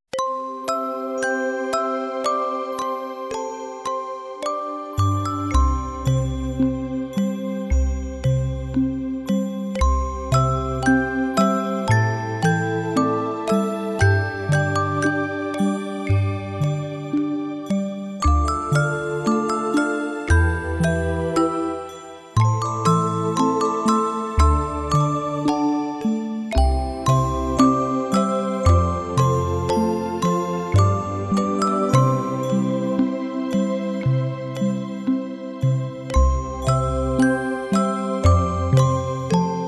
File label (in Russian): Колыбельная мелодия; kolybelnye pesni dlya detei